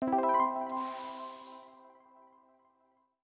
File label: Play Level